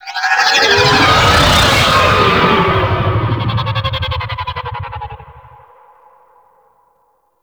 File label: Fly By Quick E; Vehicles; Flight; Warframe; WM Liset